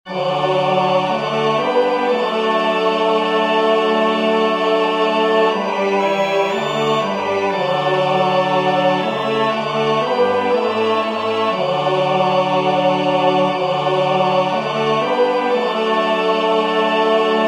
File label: Nunc Dimittis tenor; singing divine service iii in parts